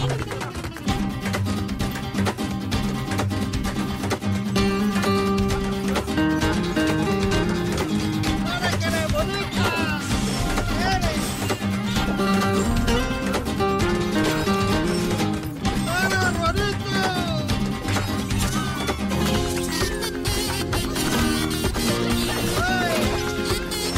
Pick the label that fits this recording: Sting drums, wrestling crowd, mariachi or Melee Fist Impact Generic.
mariachi